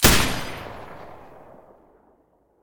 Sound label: silencer M249 stereo